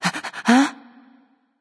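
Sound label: surprised, female; smite; voicepack; hera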